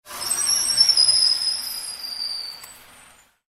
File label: brakes screeches